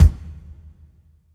124 KICK; East West - Phat & Phunky CD2; 90's Sample CDs

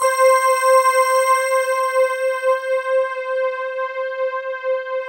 FANTASY CHF, JV PADS; Pro-Rec - Synthsonic

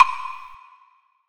Block, cartoon caper, Percussive; musicradar

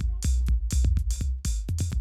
125, Classic Drum Loops

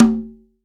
CONGA H; STEREO CONGA; Cuba

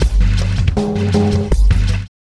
Morph Bumpers, Fx; Sonic Foundry (Sony Creative Software); Esoterik Beatz

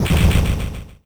Noiz; Sonic Foundry (Sony Creative Software); Esoterik Beatz; Warsaw FX

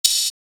snare+hat; DRUMS

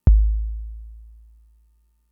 kick, Fricke MFB-522

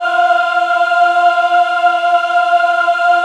F4 MW AH, Choir; USB Soundscan vol.28; Acoustic & Synth; AKAI

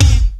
KIK SNARE; TECHNO-INDUS; Greytsounds VOL-1

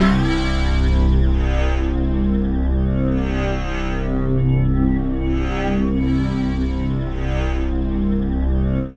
pad C, Synth Pads, Chillout; Best Service ProSamples vol.36

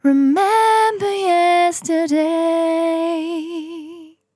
On A Star 65bpm F, Vocal; Zero-G

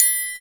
TRI, triangles; Univers Sons; Basicussions

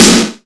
POWER, SNARE

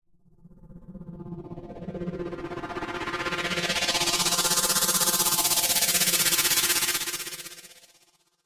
Sweep; noise

